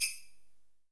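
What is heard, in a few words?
jungle perc, jungle percussion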